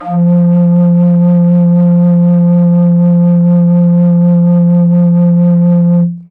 moc f2, MOCENO BASSFLUTE; PS-52 AIFF WORLD INSTR 2; WOODWIND AND BRASS

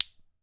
ILI ILI; AKAI S6000 CD-ROM; WorldSounds

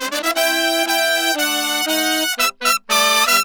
MERENGUE

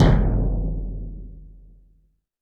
percussion, Roland TD-20

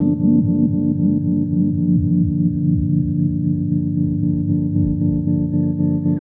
Chords Tones; Equipped Music; Loungin House

